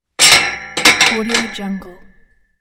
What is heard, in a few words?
gun dropping on metal grate